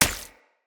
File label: Flock Attack Hit; Powers; Fey